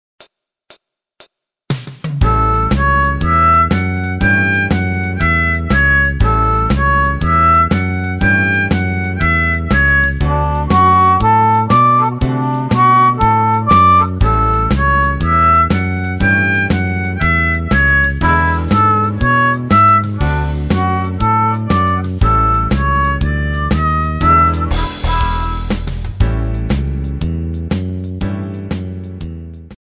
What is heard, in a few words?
harp